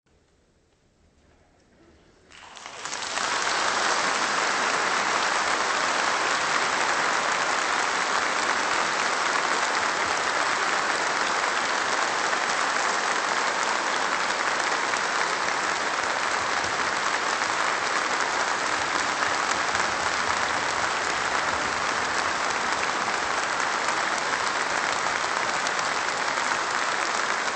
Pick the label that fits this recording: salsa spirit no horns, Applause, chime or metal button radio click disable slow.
Applause